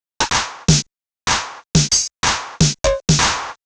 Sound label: drum loop percussion 125, Classic Chicago House; Sample Magic